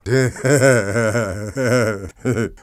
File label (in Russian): smeh; hottabych